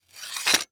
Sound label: Technology Electronic Stand C Stand Extending